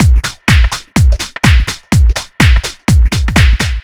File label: Fill, Drum Loops 125bpm, Nu Dance Electro; Zero-G; Soundclash Nu Dance Electro; Acidized Wavs; Tough Jam